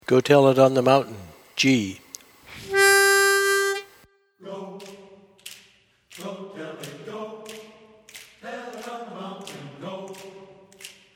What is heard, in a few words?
Tenor, Learning Tracks; Go Tell It On The Mountain